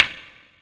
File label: Flint Strike